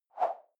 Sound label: whoosh simple slash big high; swooshes-swishes